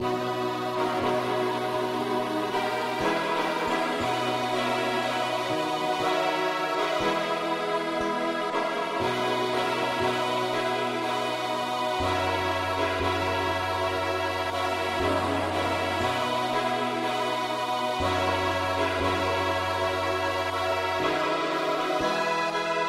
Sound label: pop No Voc Ac key b; Jesus, Saviour, pilot me